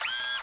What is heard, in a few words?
Panel Up